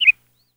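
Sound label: Meadow Lark Happy Chirp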